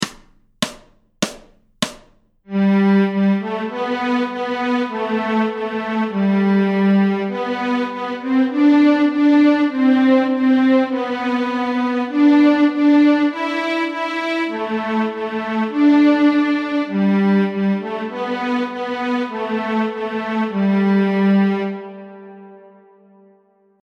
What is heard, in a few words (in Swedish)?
Tordenskiölds marsch Stråk G, nyckelharpa